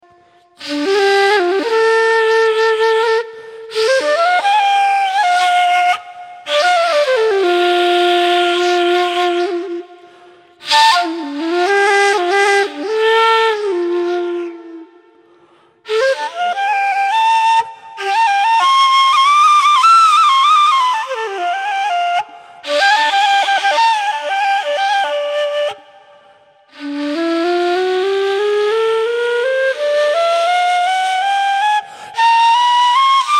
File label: Turkish Kaval E